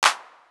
Clap, Drum One Shots; AMG - Coldcut's Kleptomania VOL-1

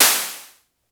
Snare Noise Splash; Lost Tapes From Mars

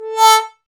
BRS BONE, Trombone; Roland L-CDX-03 Disk 2

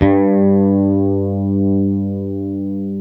G2, JAZZ BASS

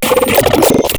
Glitch

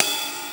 cymbal raw

